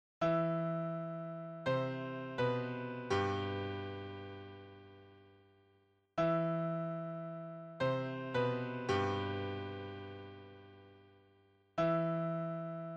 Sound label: music